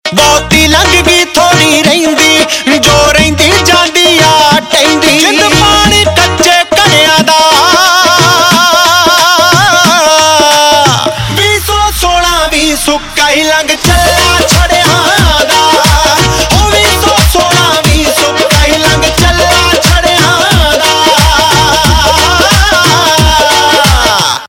Ringtone, Punjabi

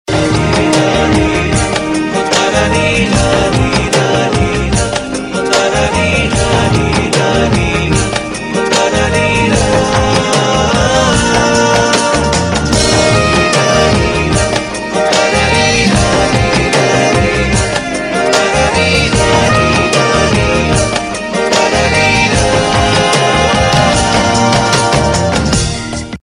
Instrumental Tune

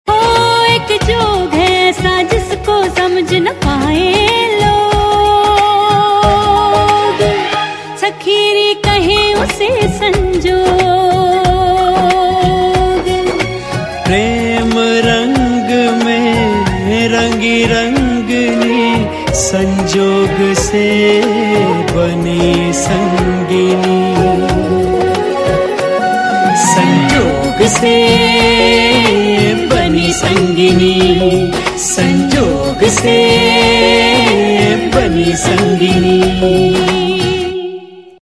Female Title Tune; Zee TV; TV Serial Tone

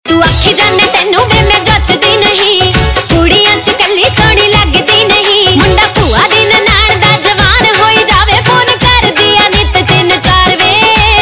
Ringtone, Punjabi Pop

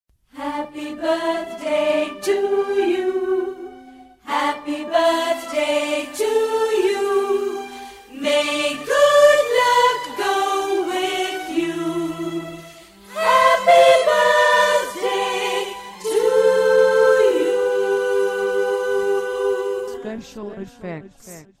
SHORT And SWEET BIRTHDAY SONG; BIRTHDAY JINGLES

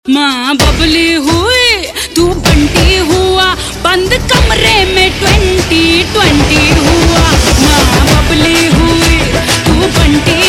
Ringtone, Bollywood; Welcome Back

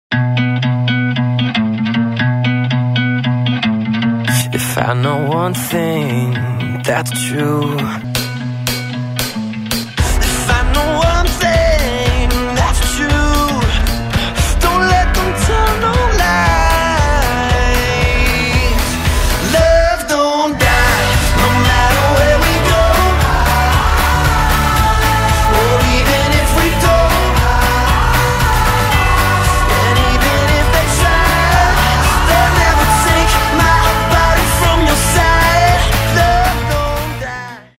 watch TV AD SONG; SAMSUNG GEAR FIT

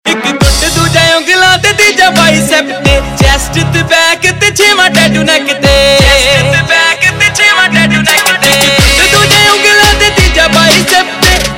Ringtone, Punjabi

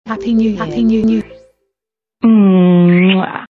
New Year Kiss

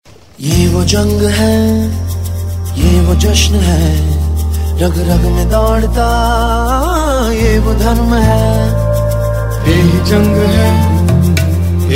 Cricket Anthem; Ringtones

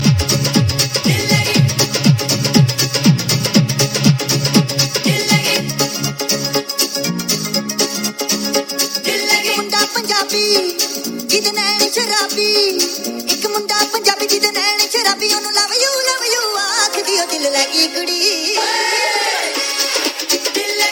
Punjabi

